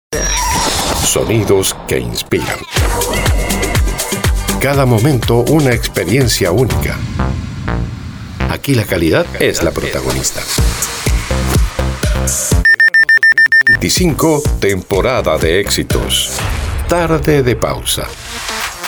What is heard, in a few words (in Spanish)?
Ambient